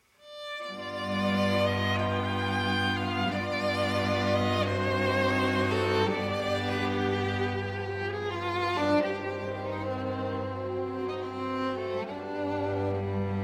String Quartet in C Minor, Rondo

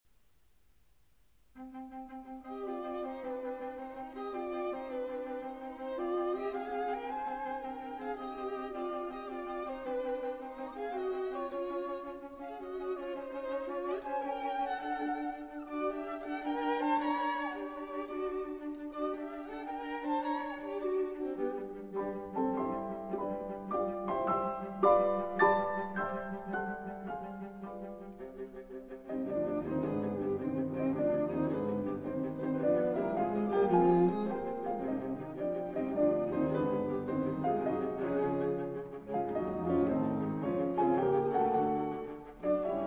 Piano Quartet in G Minor; No. 1; Op. 25; Brahms

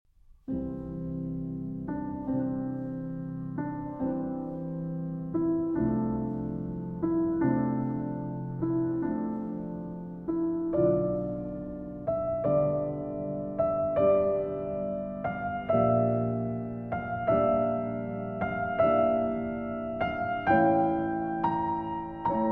Sonata for Solo Violin No. 3 in C Major

